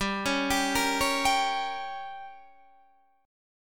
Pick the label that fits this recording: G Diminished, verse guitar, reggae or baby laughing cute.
G Diminished